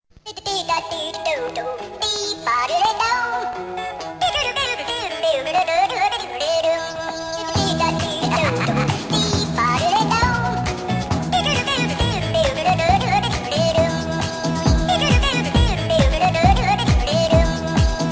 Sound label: remix